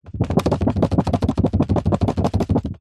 office file folder wallet card shake wobble

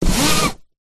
vehicles car seatbelt pull short toyota rav4

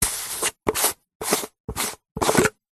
peanut butter plastic jar lid remove; food; zapsplat

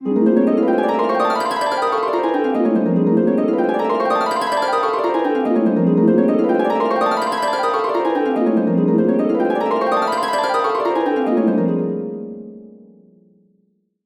musical harp discordant confused state

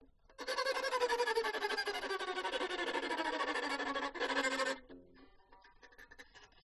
violin tremelo condenser; aston origin

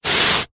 foam board slide on surface; zapsplat

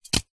aerosol deodorant can catch

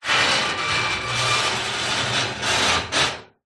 metal heavy industrial scrapes; foley

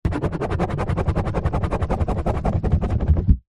pen ball point write scribble on empty card box; office

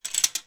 game connect 4 playing disc place in frame

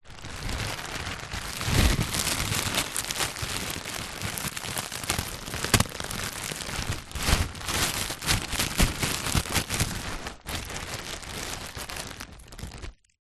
food frozen peas in bag movement; zapsplat

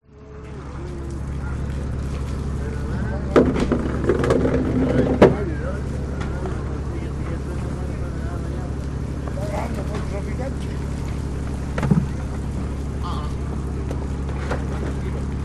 Chile Harbour; audio hero

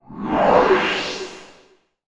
sound design transition sweep flange

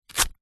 foley wallet open velcro fast; zapsplat

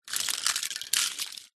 leisure toy metal cars pile push around carpet; zapsplat

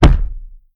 impact head slam against table; zapsplat

